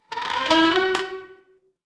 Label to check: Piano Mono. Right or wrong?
Wrong